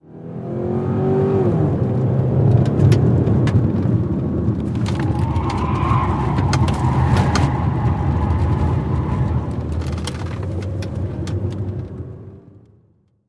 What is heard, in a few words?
Sedan Accel Corn Int